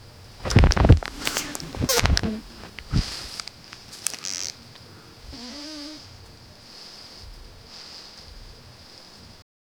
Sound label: zipper of the hazmat suit